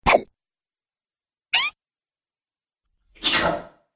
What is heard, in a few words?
Videogame Fx; Sound Pack 9; Video Game Sound Effects